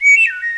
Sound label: garden; eCS 1.00; MMOS2